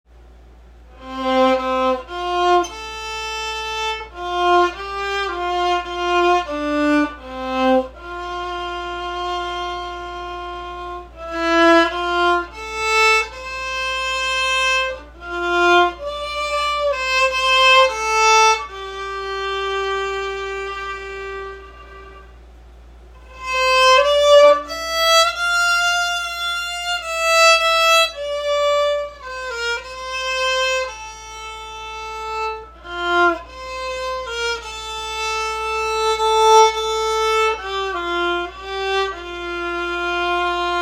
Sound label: Violin Celtic